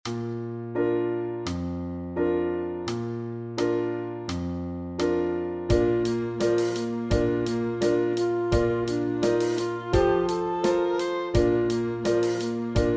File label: Sax Study